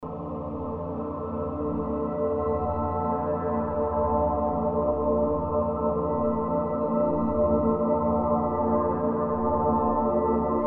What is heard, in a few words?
Amb Sound Scape Piano, multilayered drones; Musical; Ambient SoundScapes